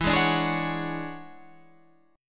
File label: E 13th, Guitar, Standard; strummed; JGuitar